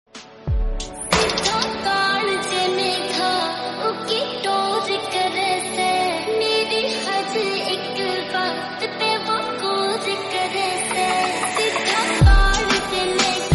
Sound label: beautiful ringtone romantic ringtone love ringtone